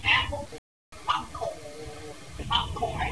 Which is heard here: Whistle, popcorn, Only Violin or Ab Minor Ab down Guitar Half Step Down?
popcorn